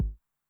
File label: BD Low Tune Med Decay, bass drum, 909; classic machines; 909mod